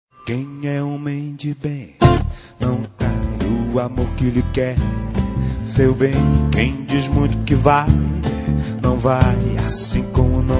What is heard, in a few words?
berimbau